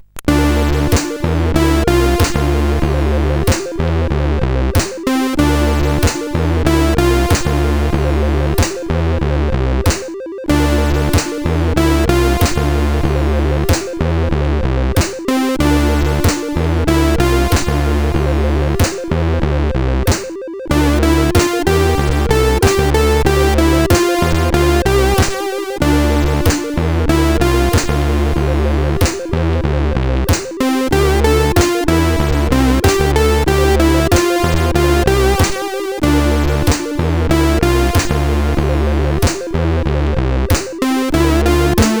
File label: Lazy Night, sid CSG8580R5